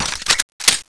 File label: clip in, weapons; brainbread